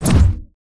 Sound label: kick real swing